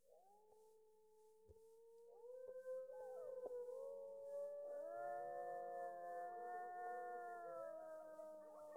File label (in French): le loup, mammifères